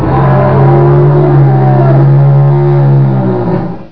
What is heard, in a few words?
garg attack